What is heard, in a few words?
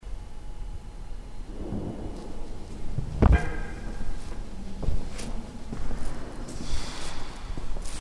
alcatraz noises